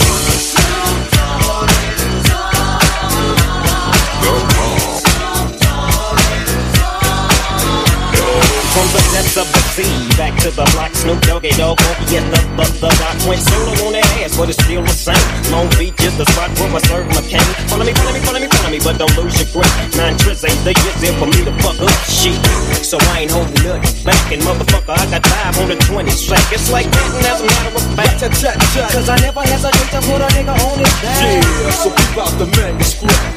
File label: 107bpm Bm